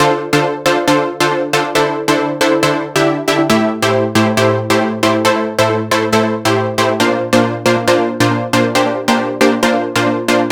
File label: 137BPM Melody Main DRY, Dminor; Melody & Synth; Vengeance Samples; Universe Kit